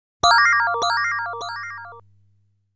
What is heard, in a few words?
Item Collect